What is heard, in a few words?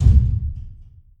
impact; tetrio